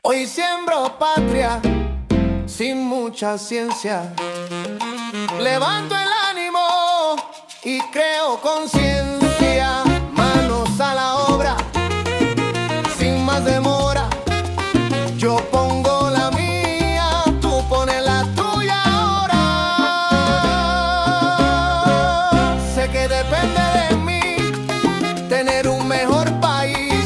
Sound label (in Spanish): salsa